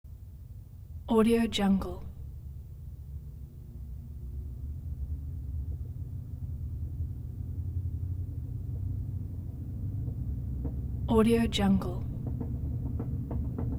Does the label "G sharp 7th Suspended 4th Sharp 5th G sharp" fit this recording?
No